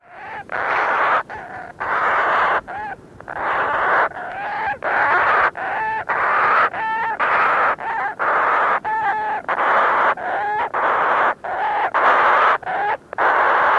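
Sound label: alarm calls, Black-vented Shearwater; MEX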